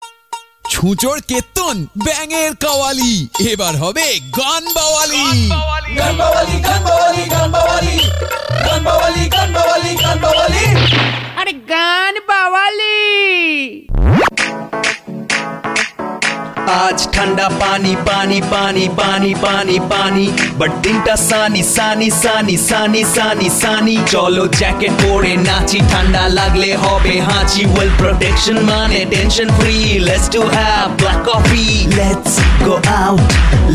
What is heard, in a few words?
bengali parody song bathing in winter thanda paani